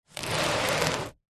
household book large old hardback slide on gritty hard surface; zapsplat